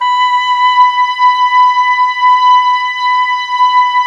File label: OBOES B, Oboes, Wood Winds; Miroslav Vitous; Woodwind Brass Ensemble